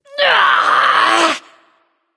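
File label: grunt lift, female; smite; voicepack; hera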